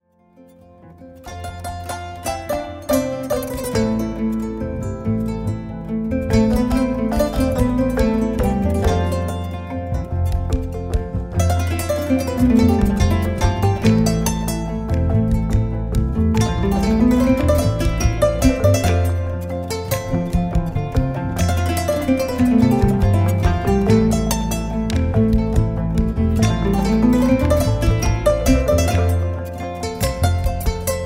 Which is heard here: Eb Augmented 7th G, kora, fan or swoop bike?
kora